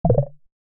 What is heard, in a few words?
break; rolisteam